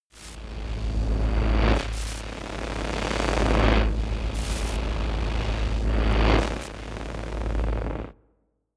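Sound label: jam, electronic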